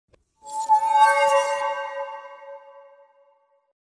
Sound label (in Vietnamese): nhạc nền yêu tinh yêu quái xuất hiện kinh dị